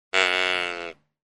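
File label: white-tailed deer sounds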